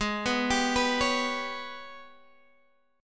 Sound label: Ab Minor Major 7th Double Flat 5th, down, Guitar, Half Step Down; AbmM7bb5